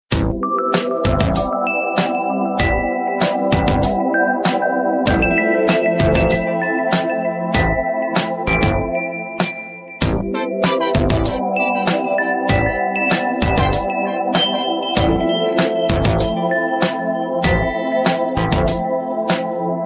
Lg Anticipa, ringtone

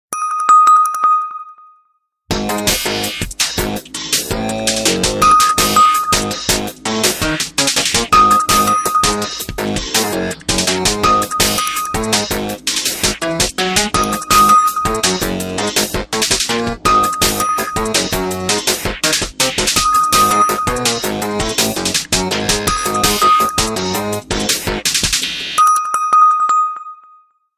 Htc Awesome, Ringtone

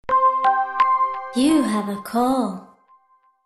Sony Ericsson 5, ringtone

